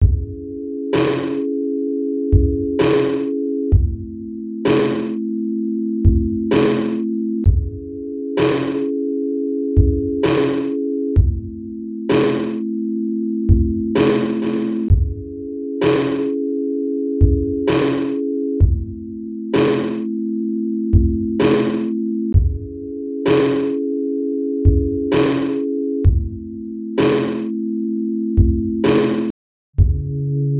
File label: Sinewave